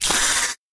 Enemy Boss Punch; Pastor Cruzes

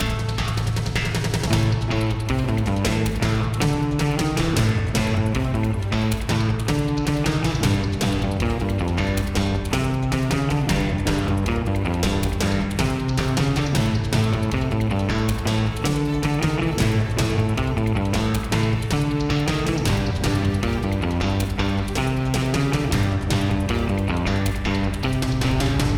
Tornado Platforming MIXED; ben 10

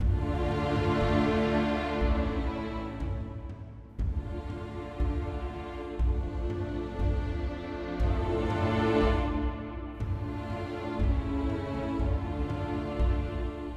Sad Main

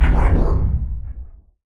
Energy Wall Fade Out

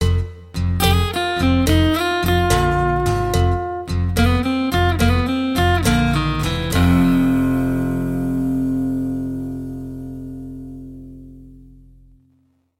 Failure; MLB Power Pros

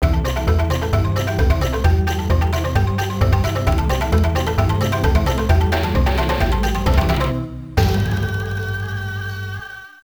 Unused Jingle; Tao Taido arcade

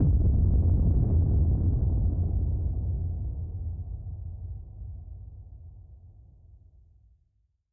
boom; Level4 Hidden World

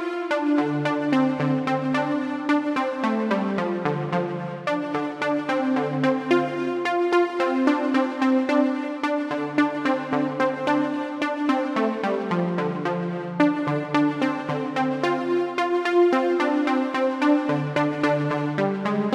Instrumental Synth; nowhere station

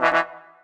Error; daVinci

